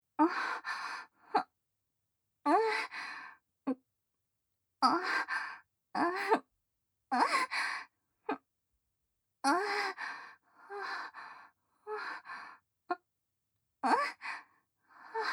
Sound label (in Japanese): 喘ぎ声・普通